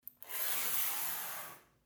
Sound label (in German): Schmutz wegwischen